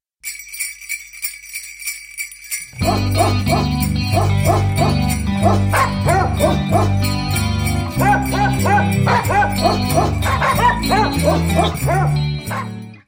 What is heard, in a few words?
Jingle Bell Dogs